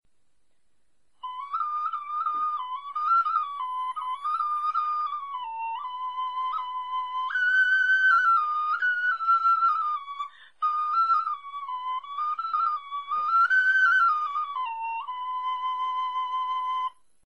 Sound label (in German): melodie, knochenfloeten